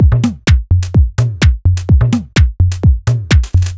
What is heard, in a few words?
Solid C# 127bpm, House Drumloops; 90 s Sample CDs; Zero-G House Fabrik Drumloops; ZG Drumloops